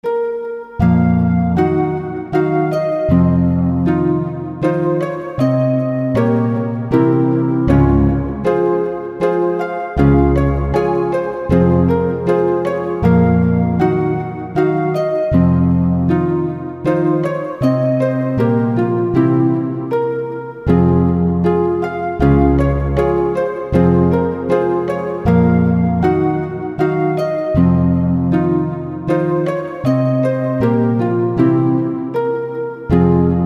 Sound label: Waltz